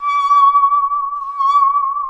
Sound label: saw danger, music, zombat